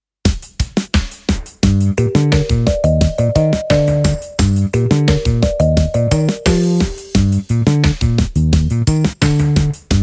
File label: instrumental